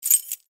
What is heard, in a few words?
keys